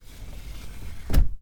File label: window close